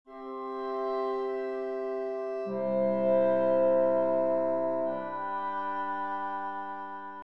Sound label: chords; Nikolai Rimsky Korsakov